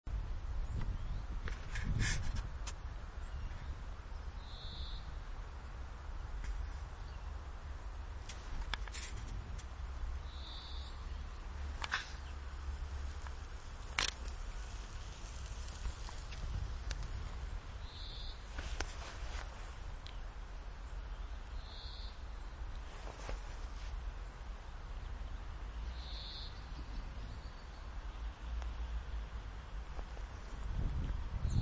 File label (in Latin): Chloris chloris; SETE